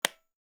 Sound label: switch